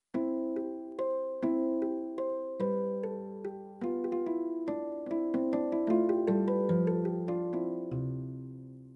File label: harper tune; dragon riders chronicles of pern 2001 dreamcast gamerip